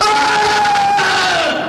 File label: scream male